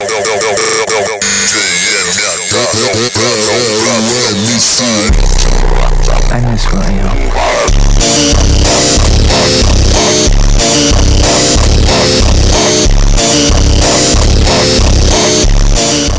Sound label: phonk